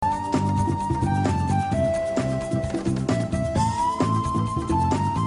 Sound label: Game Tune